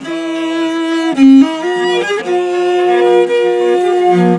VIOLI LP, MORIN.VLS, Asian Traditions; Soundscan vol.60; AKAI

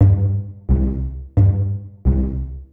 Happy Timez Bass-G, lounge, 088; Zero-G Kocktail Kollection; Construction Kitz (Lounge); Happy Timez Mixes 088

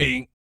BASS FX, Vocal Planet, Jazz FX; Spectrasonics

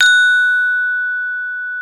BRONZE PEK, Bronze Gamelan

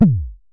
Synare Filter; Star Instruments Synare 3

